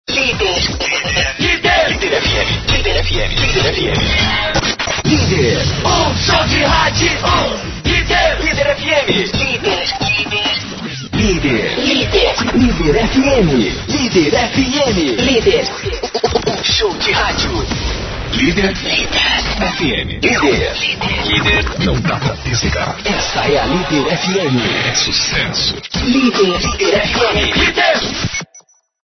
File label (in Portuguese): vinhetas; slider plus